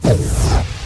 Magic Attack

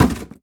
Drone; rabbids multiverse; iOS, macOS, Mobile

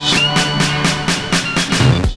Chorus Break 4 Beats

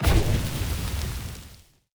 flamethrower

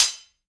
Sword Hit Impact; Legend of Keepers

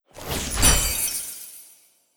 Attack Elfe

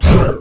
Attack; Nian Attack; Knight Online

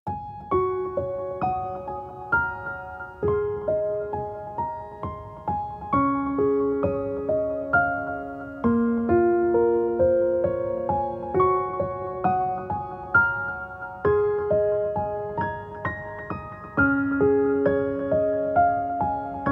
Romantic; Grimlight: A Tale of Dreams

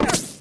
Bullet Hit; Mars Sortie

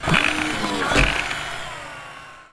Working On Suit

